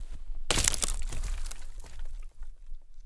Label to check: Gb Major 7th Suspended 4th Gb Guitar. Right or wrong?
Wrong